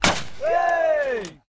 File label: sport clay pigeon release shoot hit cheer uk